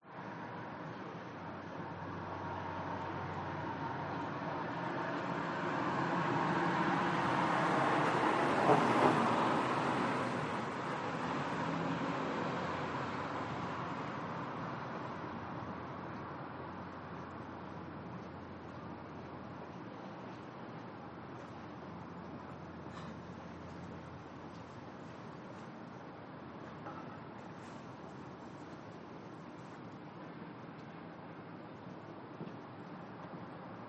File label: BALCONY Evening Residential AMB Cars Passby People Walking by Human Activitie; Dogs Bar